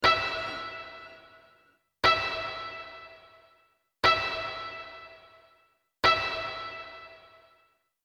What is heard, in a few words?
Sample loops